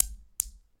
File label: newton cradle